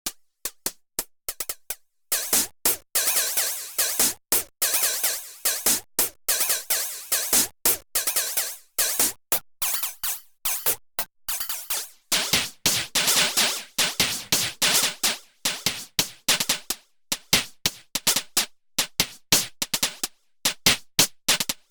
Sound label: Hi Hats LFO